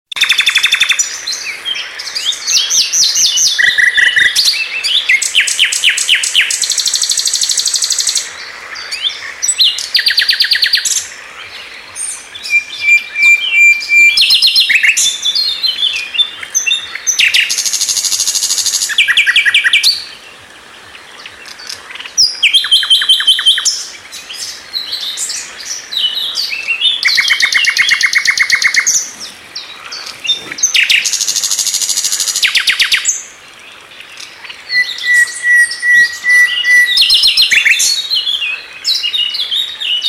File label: singende nachtigall lovebird tengkek